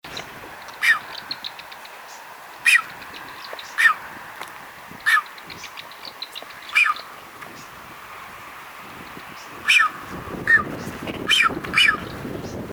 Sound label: Blue footed booby; پرنده بوبی پاآبی